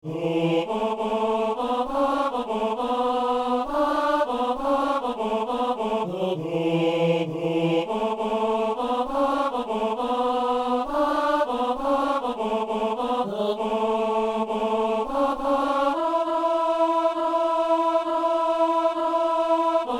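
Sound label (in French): ténor; Louez Dieu, tous les peuples; (Alleluia irlandais)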